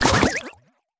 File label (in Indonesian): snowman kebentuk salah